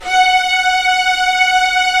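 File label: string section; Roland - LA Composer vol. 1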